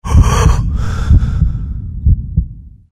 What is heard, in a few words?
scare; slender; amnesia